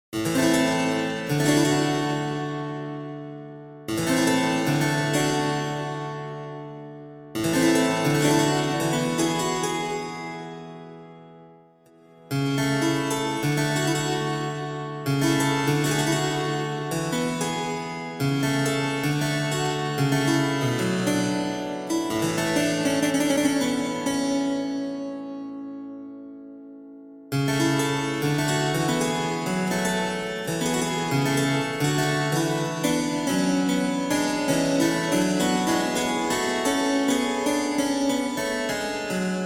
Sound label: Historic Baroque